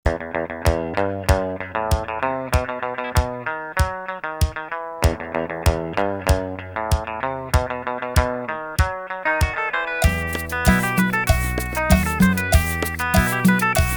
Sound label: Cumbia